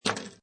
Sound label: Pinball Sound Ball Coming To Stop; Video Game; Games